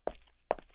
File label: walk; FPSSample